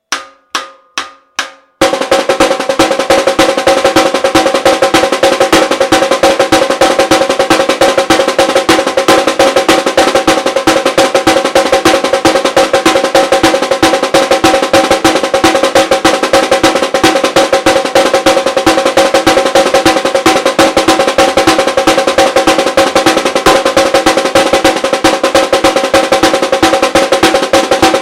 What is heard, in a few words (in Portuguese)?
batucada caixas caixa 144 bpm